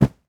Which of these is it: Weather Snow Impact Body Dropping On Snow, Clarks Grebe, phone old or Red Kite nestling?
Weather Snow Impact Body Dropping On Snow